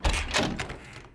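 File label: salon open; Mafie 1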